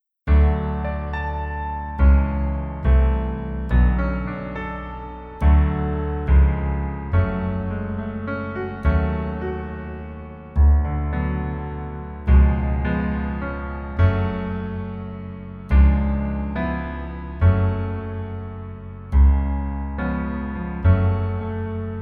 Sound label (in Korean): Piano ver 남자 키